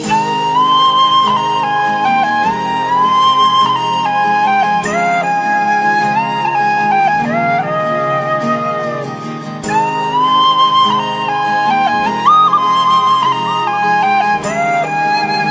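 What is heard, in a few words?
background Music Ringtone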